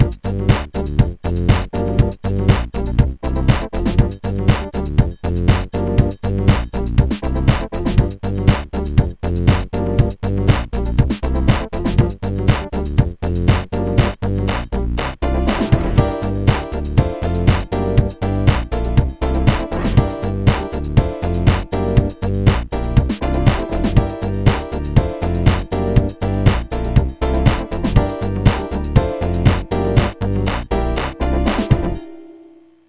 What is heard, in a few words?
air loop; Msntv; msnMusic